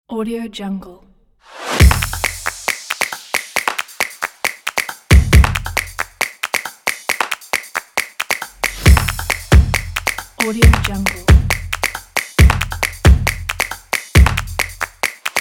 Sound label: the claps